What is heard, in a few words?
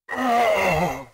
Big die; RedDot